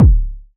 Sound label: KICK